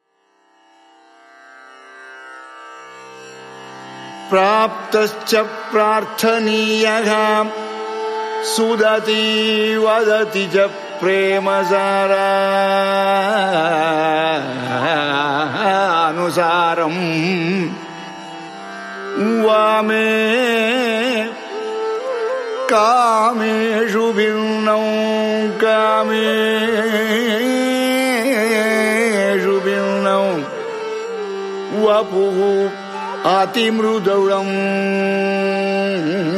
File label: shivashtapadi ashtapadi yadukulakambodi sloka; sanskrit